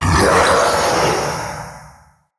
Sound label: Male Vocal Pre Death, Enemy; Mockery; Mockeries